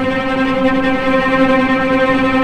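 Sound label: cellos tremolo C4 piano right, string; East West - The Ultimate String Collection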